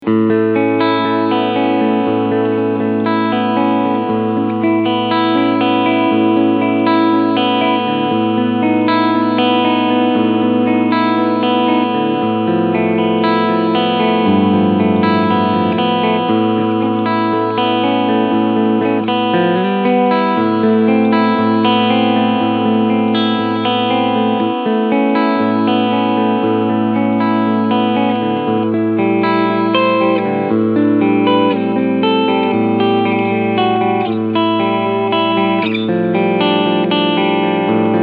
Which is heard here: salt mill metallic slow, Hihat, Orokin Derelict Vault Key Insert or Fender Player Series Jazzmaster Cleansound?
Fender Player Series Jazzmaster Cleansound